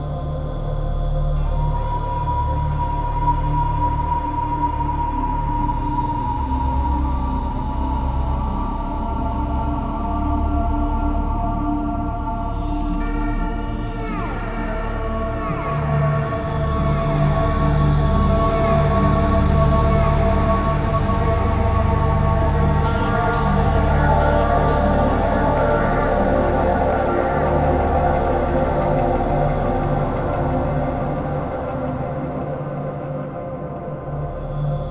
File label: Percscape